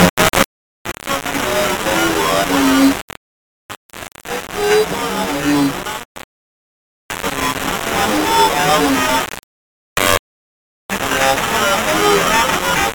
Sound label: Static Radio Glitches; bottlerocket